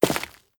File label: Stone Walk